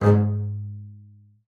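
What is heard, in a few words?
marcato G#2 right, bass spiccato; Xsample VOL-5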